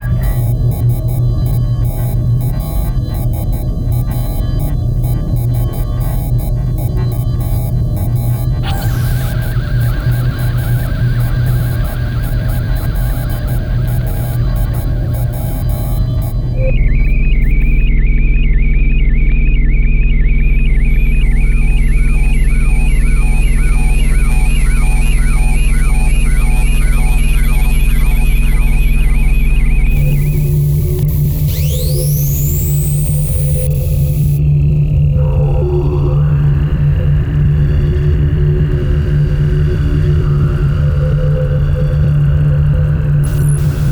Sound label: Sci-Fi Communication Sound Effects; Lost Locator; Bluezone